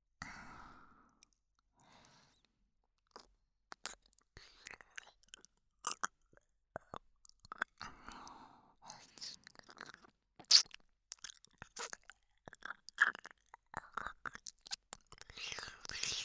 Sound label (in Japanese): 耳舐めリピート 左耳; NTR; おまけ耳舐めリピート 左耳ver